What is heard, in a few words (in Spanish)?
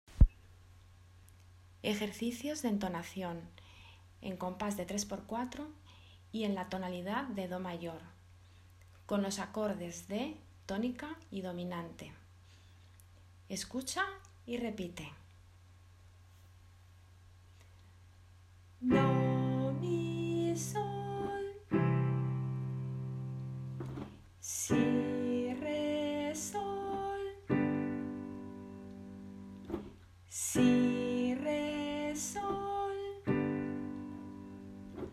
impro entonación